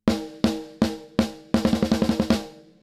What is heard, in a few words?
snare; ADC1 Compressor